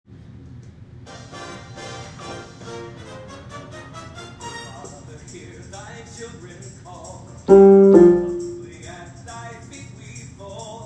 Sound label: Bass We Beseech